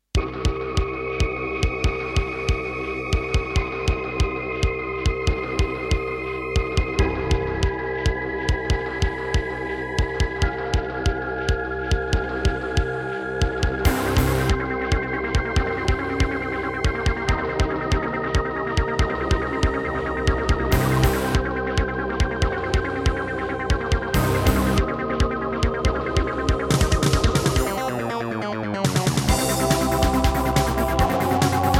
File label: Roland SC-88 Pro 55MAP; sigil doom episode 5